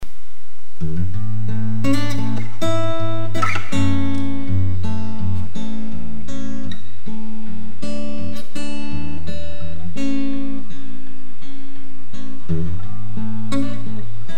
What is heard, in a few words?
guitar doodles